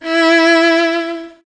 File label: Vln E5; Strings; DirectX SDK; DMusProd